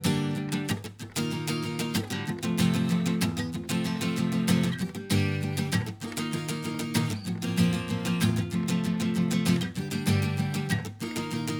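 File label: Acoustic Guitar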